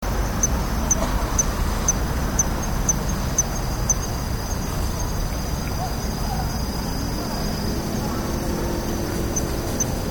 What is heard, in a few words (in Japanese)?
kanetataki